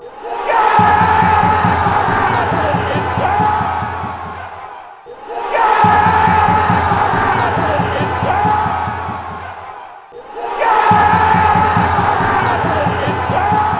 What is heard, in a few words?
goal wales, chants